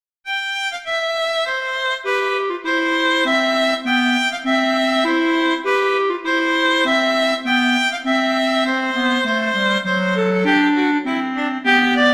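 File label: Duet, horn; Mozart; Duets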